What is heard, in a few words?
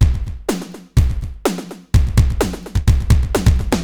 80s delay Akai XE8 (125BPM), cyborg beats; musicradar